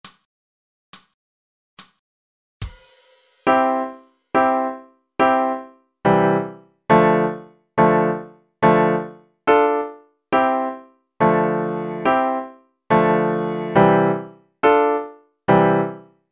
Triads Play; Choi hop am

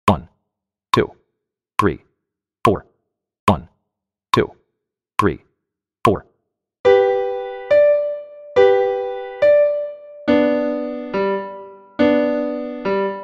Couplet Capers 70 BPM x2 count in

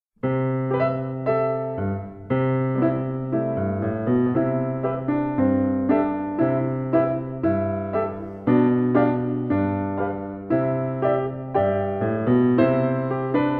Beat

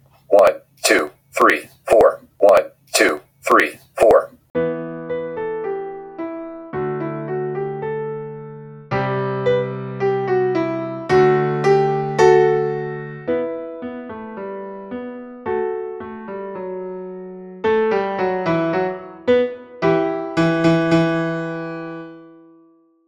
Medieval Pageant 110 BPM count in

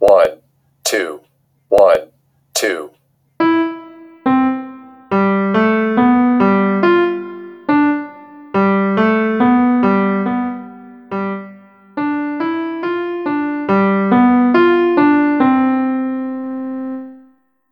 Hey Ho See Ya Later 70 BPM

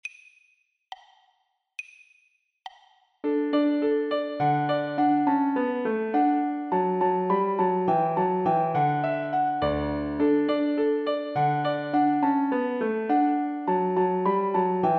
Fanfare tempo 69; Technical work (Kỹ thuật)

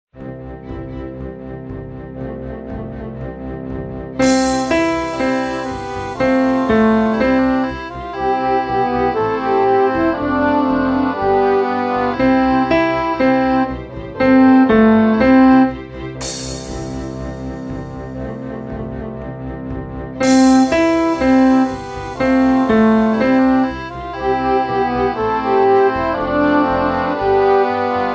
Beat; Hay thu lai

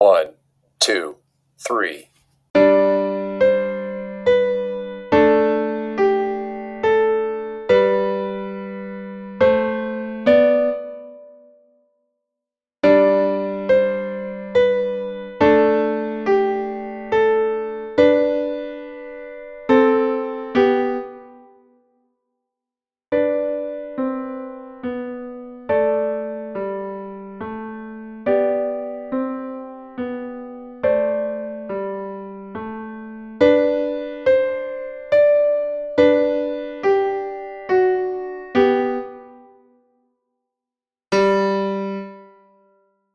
Butterfly Lullaby 70 BPM

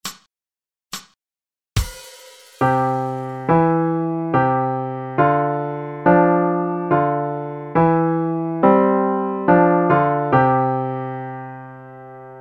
Contrary Fingers; Nhung ngon tay nguoc chieu

